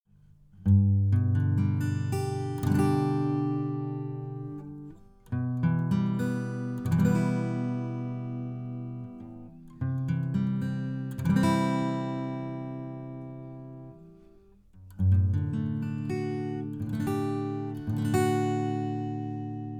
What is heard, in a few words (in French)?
guitare